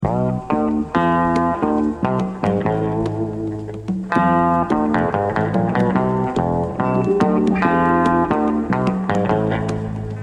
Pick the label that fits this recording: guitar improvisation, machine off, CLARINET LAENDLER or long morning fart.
guitar improvisation